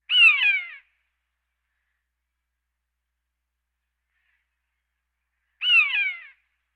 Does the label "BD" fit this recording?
No